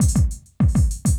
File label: loop, 200 BPM; Ueberschall; Drum & Bass Resonance